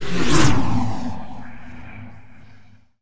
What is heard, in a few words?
Sucking Flyby Whoosh